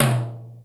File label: SY77 TOM, drum; Crypton - DrumBase5000; SY77 DRUMS